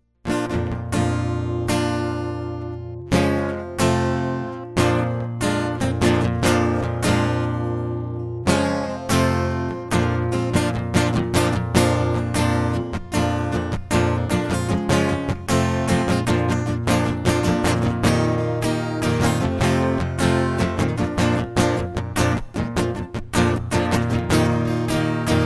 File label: acoustic